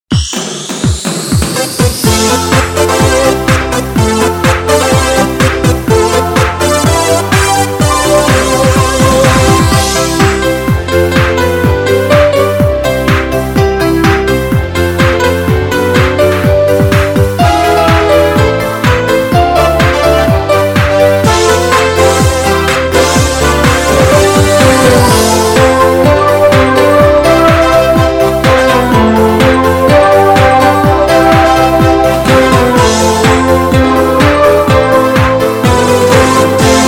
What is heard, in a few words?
Anthem instrumental